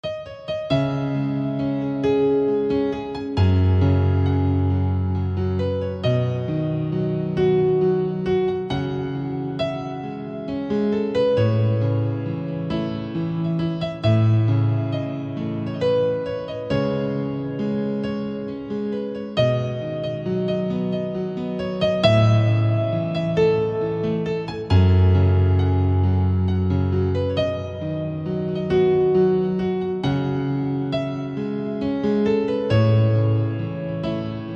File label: Piano